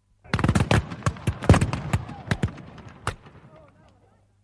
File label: volley of musket fire seventeenth century muskets